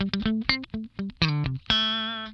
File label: DAMPED, Funk Guitar; 90 s Sample CDs; Zero G